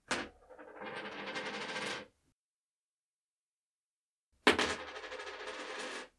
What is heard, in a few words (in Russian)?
padenie moneti s vrasheniem; zvuki zvona monet i kopeek